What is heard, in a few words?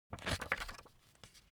foley paper magazine flip forward; smartsoundfx